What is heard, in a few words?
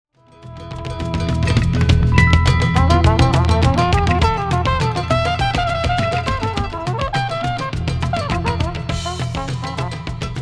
spanish